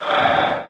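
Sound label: Movement Breath Out; smite; god bakasura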